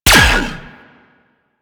Laser Fire 3D; Warframe; Corpus; Ambulas

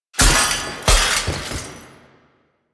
Stealth Hit Foley; Enemies; Finishers; Dax; Warframe; Duviri

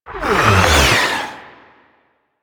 Excavation Scanner Deploy; Missions

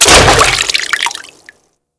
Frame Step Water D; DE; Warframe; Vay Hek

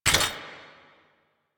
Footstep Default; Warframe; Hoplite

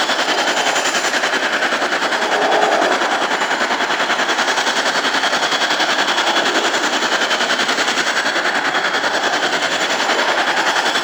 Movement Slow Engine Loop; Warframe; Vehicles; Archwing Demolition

